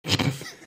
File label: snorting laugh